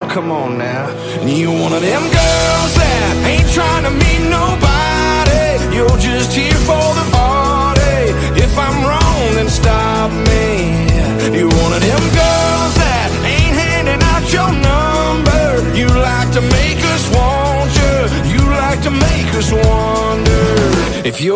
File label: english song ringtone